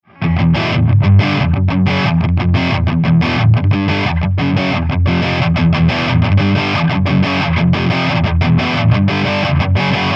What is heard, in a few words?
TS rhythm